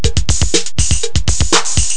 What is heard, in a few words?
techno